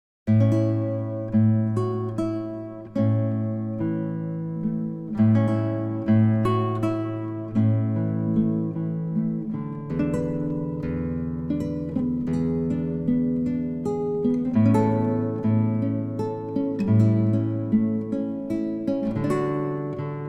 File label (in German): Stille Nacht heilige Nacht, Sologitarre